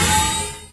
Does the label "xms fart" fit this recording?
No